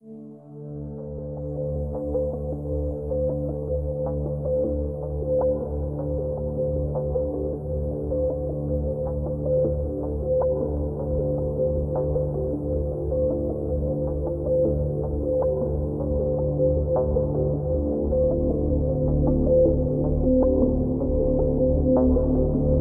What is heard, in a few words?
Ambiant; Beyond Divinity; german